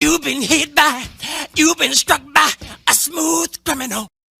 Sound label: vox